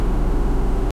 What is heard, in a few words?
electric car idle stopped; eletric car idle stopped